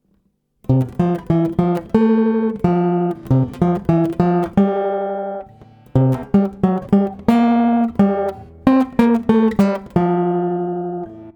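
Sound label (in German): Melodie Auf der grünen Wiese steht ein Karussell; kinderliederquiz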